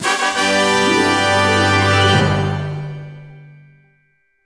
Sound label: Boast Screen Stinger